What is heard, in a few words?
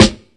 warm acoustic snare sample D key; snare drum samples